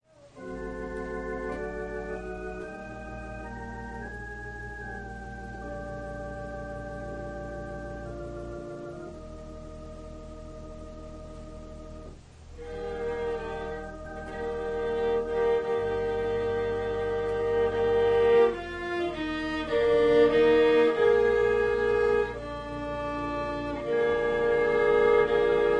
Organ and violin prelude

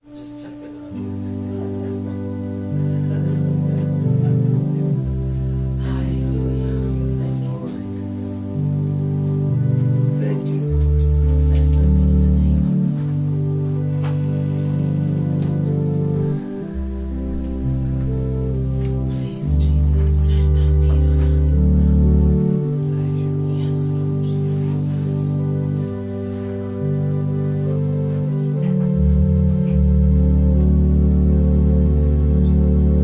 Organ prelude; The call to Tortola

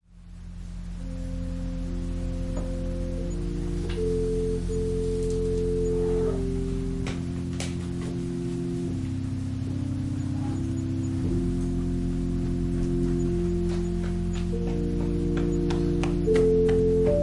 Organ prelude

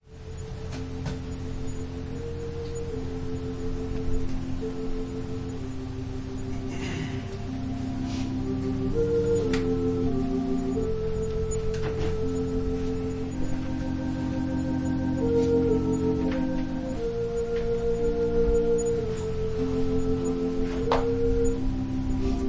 Organ prelude

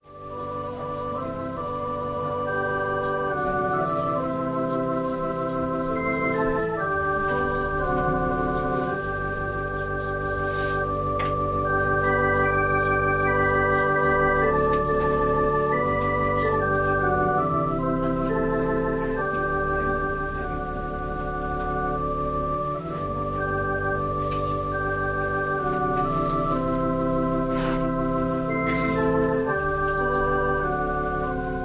Organ prelude

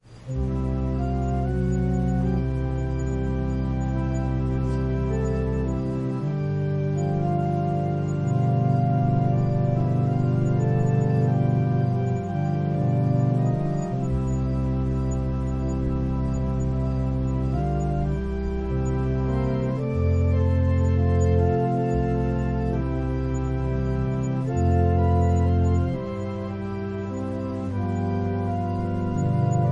Organ prelude; Saturday Evening; A good work begun and carried on

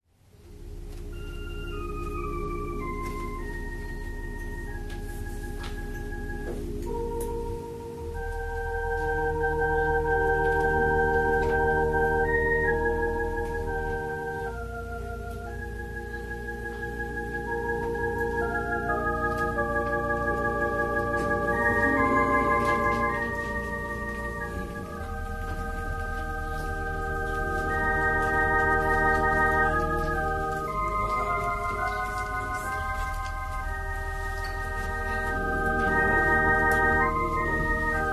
Organ prelude, Opening worship

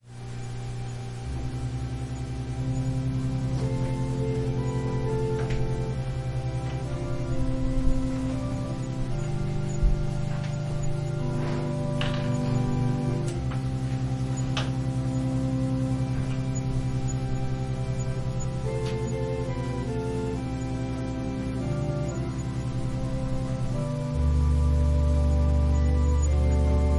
Organ prelude; Disappointment

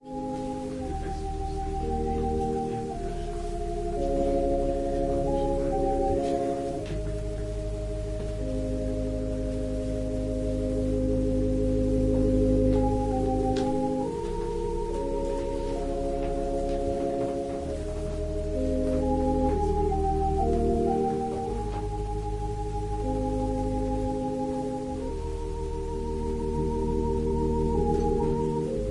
Organ and violin prelude

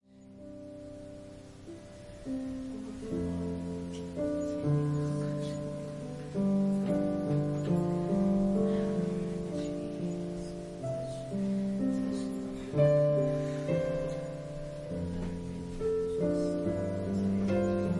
Piano prelude; Prayer Meeting

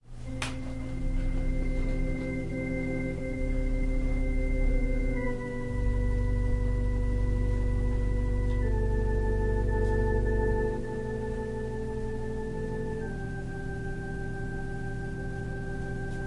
Organ and violin prelude

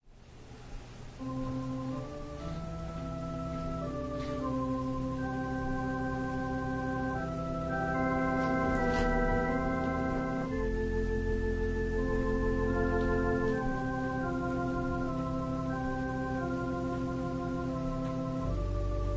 Organ prelude

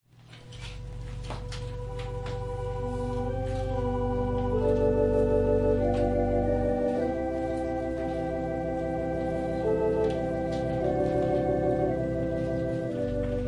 Organ prelude

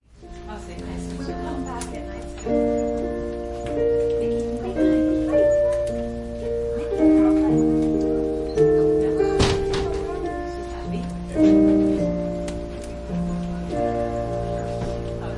Piano prelude

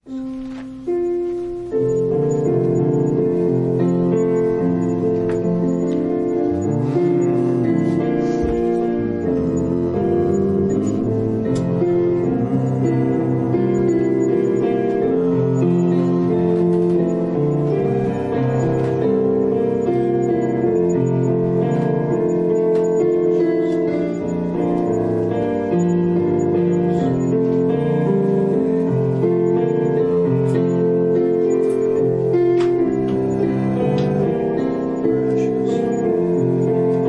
Piano prelude

